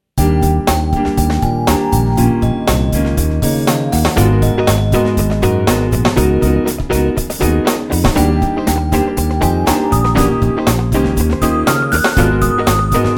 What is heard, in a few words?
org rough